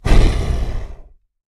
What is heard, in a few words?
growl; smite; god sobek